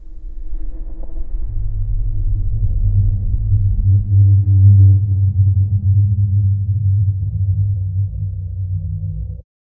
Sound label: cinematic moonlit pyramid